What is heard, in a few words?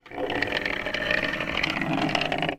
toy wooden train roll on wooden surface; zapsplat